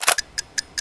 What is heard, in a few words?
blast mine